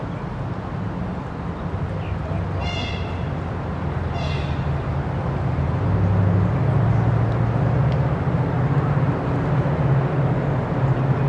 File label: lake across bbq bridge; Coburg Lake; Coburg Lake 2026